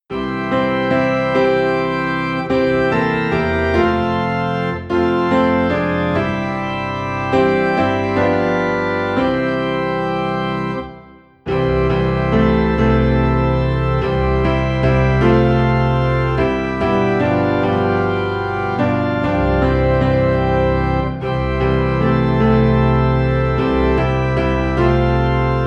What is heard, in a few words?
Piano and organ Low in C